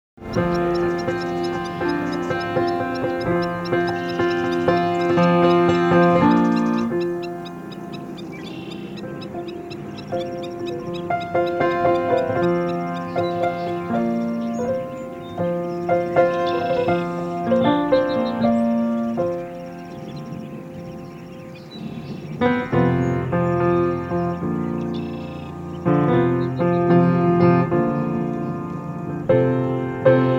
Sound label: groove; wishy-washy